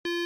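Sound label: Medium Button Beep Short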